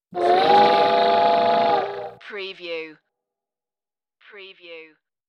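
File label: Train Whistle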